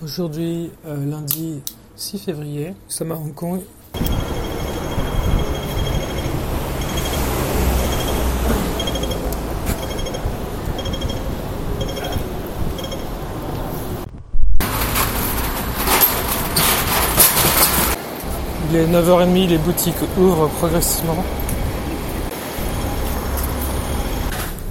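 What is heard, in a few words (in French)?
Bruits de la ville, Hong Kong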